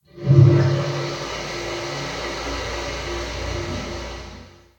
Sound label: Amb Canalisation Amb OneShot Far